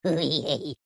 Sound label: weirdlaugh